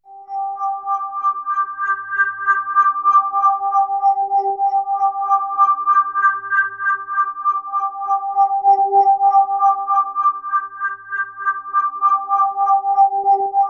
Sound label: SECND SUN G3, ATMOSPHERICS; Music Store - Claudius Diemeter Schwerlos